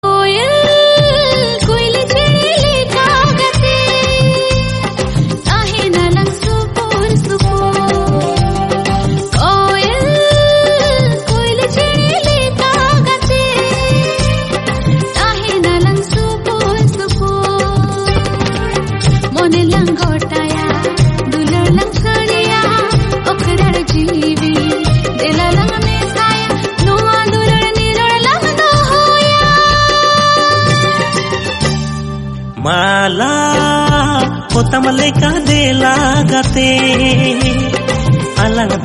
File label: Santali